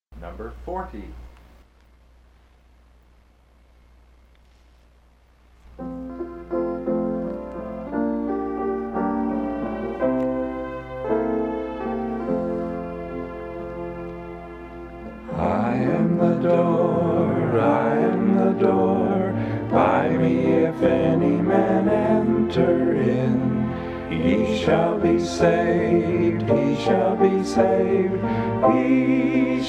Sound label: Hymn